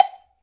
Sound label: COWBELL, Percussion; InVision Interactive; Percussion Slam; PROGFROG